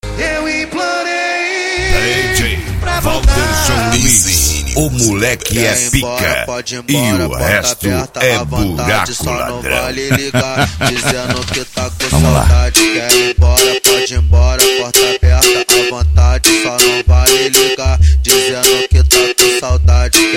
funk

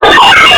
instrumental; em gravação

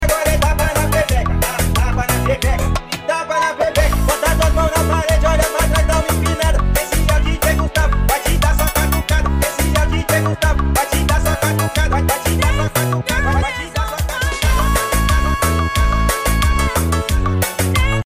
bregafunk

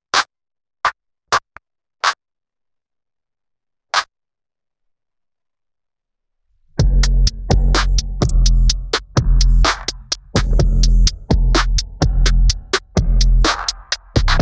instrumental

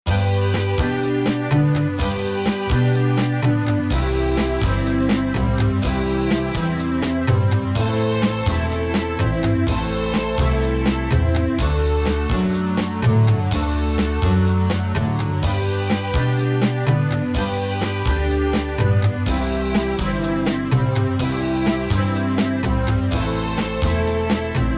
instrumental, country

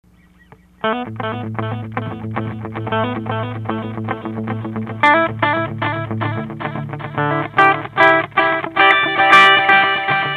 Pedal DDX-3

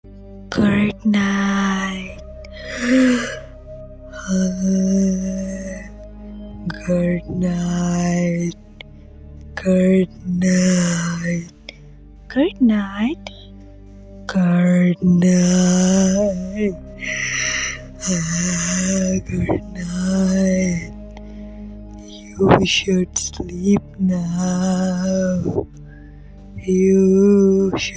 creepy girl singing good night scary horror voice

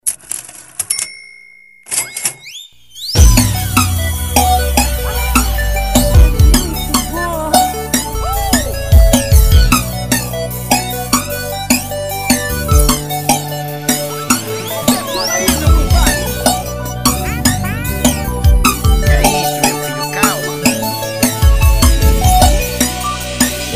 PSR-530 AX-Synth vinhetas

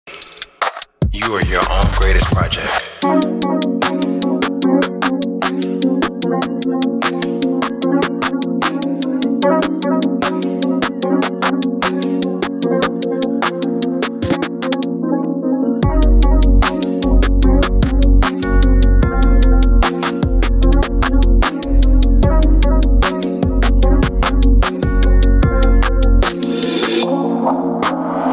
instrumental

